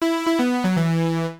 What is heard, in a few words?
LEVEL FAILED; FRUIT FACTORY